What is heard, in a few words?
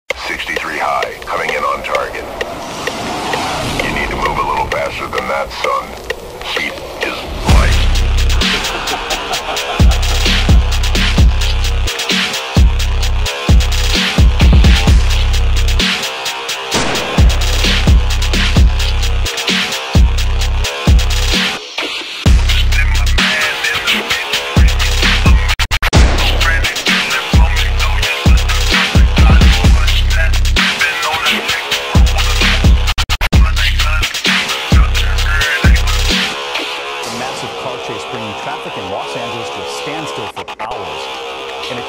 Phonk